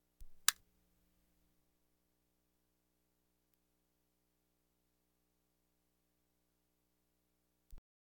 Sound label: Household Phones